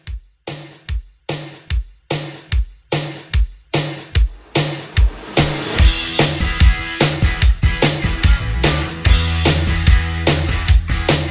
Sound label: 60s Rock Re-Drum 147